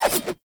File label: Nikana Idle Swing Double B; Tenno; Revenant